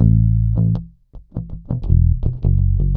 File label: bass, Loops, Phonky